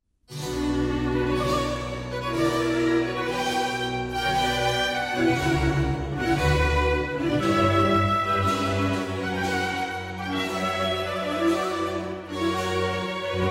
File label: Orchestral Suite in B Minor, Ouverture - Menuet; Orchestral Suite No. 2; BWV 1067; VII. Badinerie